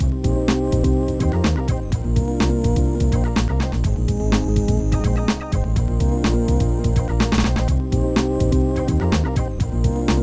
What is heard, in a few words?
chinese groove; Hollywood; Trackers; Modland